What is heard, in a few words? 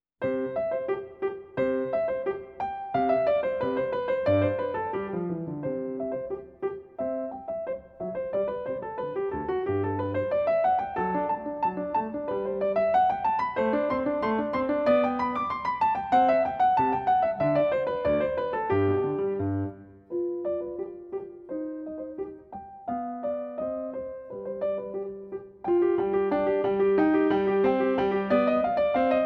Sonatina in C Major; op 36, no 1 (complete); Muzio Clementi; Celebrate Theory recordings